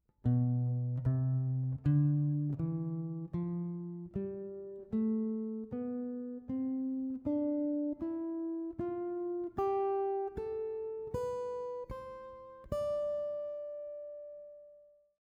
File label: C Major scale shape, guitar; how to harmonize a melody on guitar using 3rds and 6ths